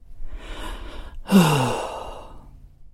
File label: Exhale; Batte-Man